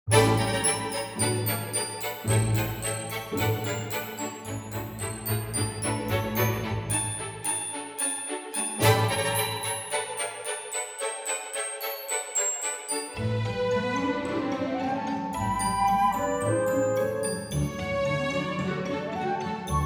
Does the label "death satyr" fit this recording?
No